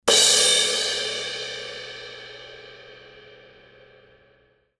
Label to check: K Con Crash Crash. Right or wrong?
Right